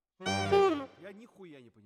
Tenor, RNR for piano

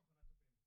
BD In

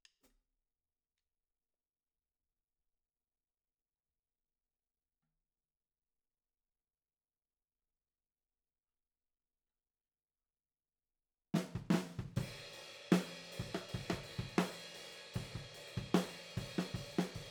OH A

